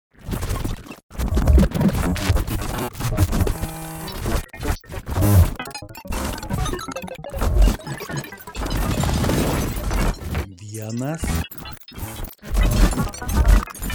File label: Synthetic Field Dynamics; Lithuania